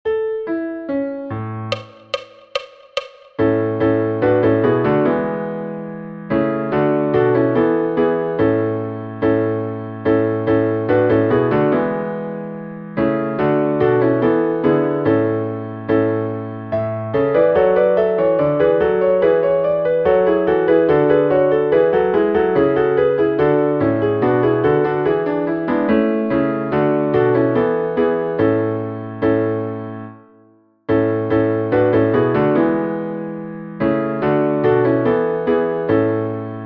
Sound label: ding dong all; Eklektika Music